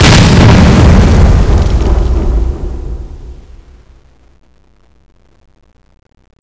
c4 explode

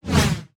swoosh; deathrun tropic

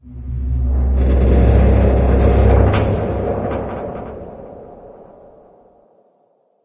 script metal; secret garden

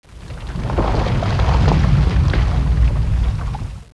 quake

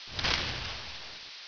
grass

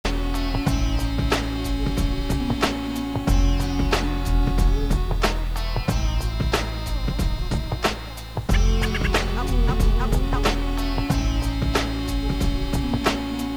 egyptian

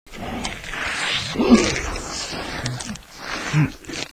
creature

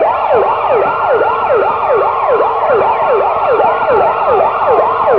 outside mall

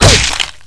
headshot female

